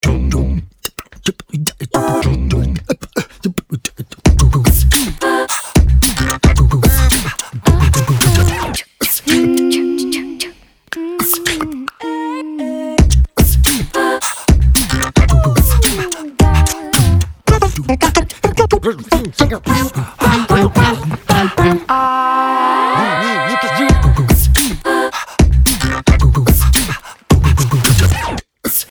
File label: Mouth Music; Maven Dental; audio brand identity